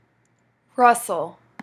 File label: rustle